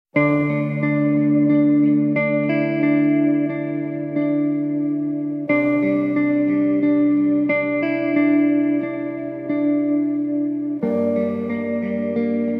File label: Curious Feeling (Guitar)